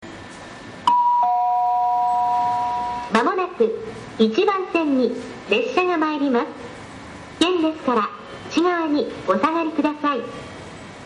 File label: sekkin; gotemba